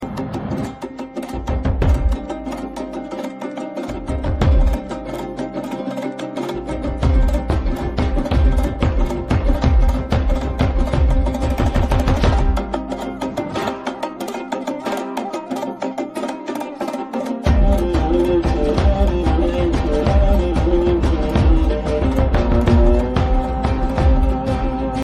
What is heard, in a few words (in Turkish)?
zil sesi